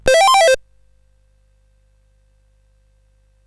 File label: sid CSG8580R5; Jumpman Junior